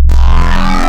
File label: Strike, Wavetables; Cymatics; Outbreak Wavetables; Serum